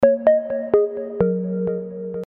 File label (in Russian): sms soobschenie na htc iz reklamy klassnaya melodiya sms